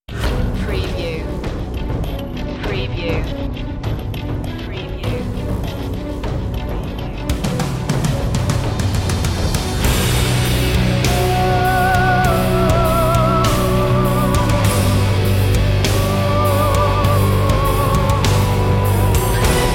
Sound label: heroic anthem rock mix